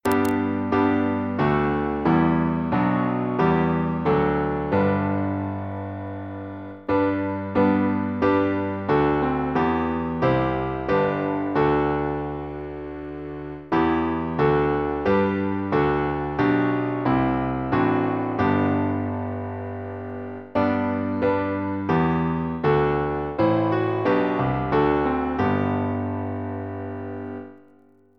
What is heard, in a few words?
Doxology piano